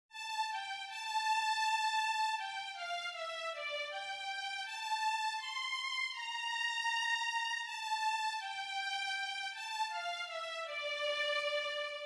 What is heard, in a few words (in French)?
VIOLON; Garde Moi Mon Dieu; violons